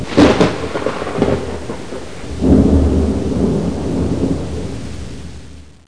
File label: BOLT